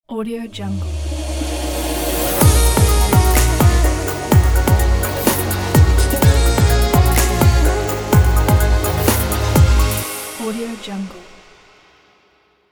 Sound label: upbeat fashion logo